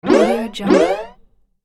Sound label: jump double